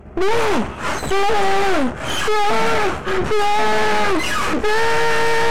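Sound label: falling downstairs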